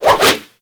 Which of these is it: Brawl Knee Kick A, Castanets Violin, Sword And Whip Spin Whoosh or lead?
Sword And Whip Spin Whoosh